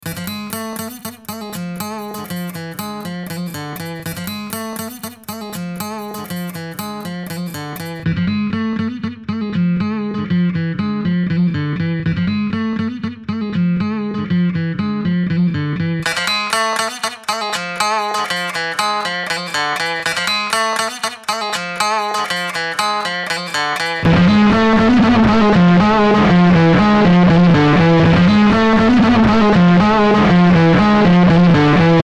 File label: Dist Opamp 21 Guitar; ARTURIA